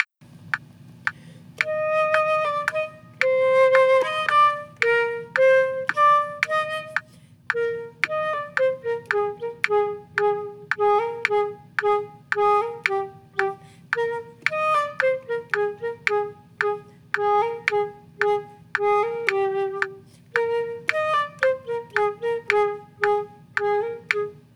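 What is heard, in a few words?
trio with percussion; bella bimba; toe excellence in chamber music bk 1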